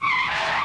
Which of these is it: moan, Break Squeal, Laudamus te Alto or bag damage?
Break Squeal